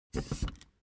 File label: object chair office hydraulics down stepwise; smartsoundfx